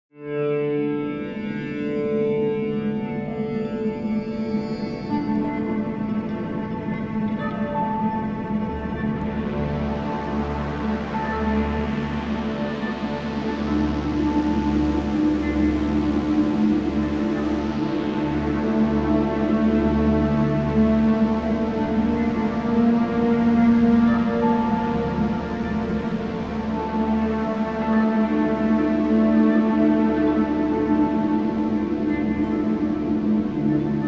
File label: music space; Ioncross Total War